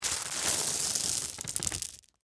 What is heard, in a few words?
Bag Marbles Dump, Foley, Plastic, Out; Bag, Marbles, Dump, Toy